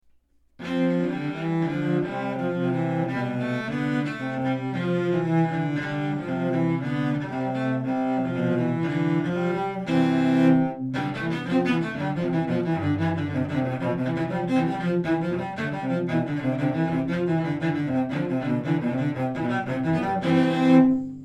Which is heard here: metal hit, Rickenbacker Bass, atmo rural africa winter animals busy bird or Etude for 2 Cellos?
Etude for 2 Cellos